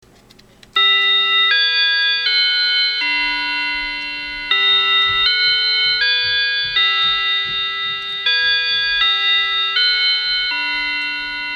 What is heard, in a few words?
Westminster Chime